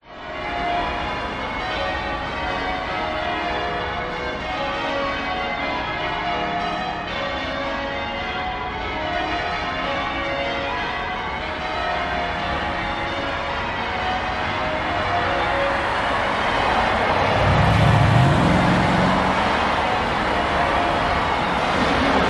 public places city cathedral bells chime acorss road light traffic; st marys sydney